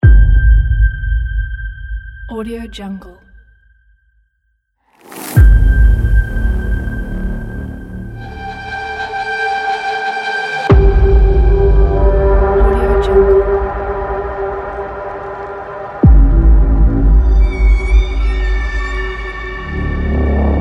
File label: tense